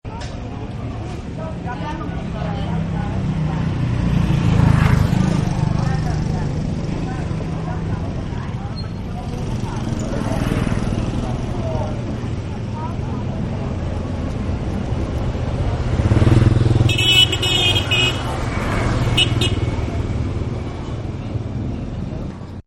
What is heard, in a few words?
Traffic Outdoor Pho Stall; Traffic And Cars; Partners In Rhyme